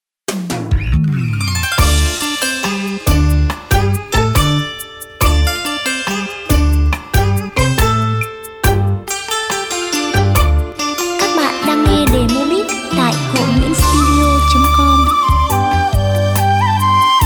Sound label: beat, playback